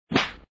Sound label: play card